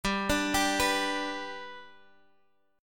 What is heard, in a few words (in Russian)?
Akkord G lad perebor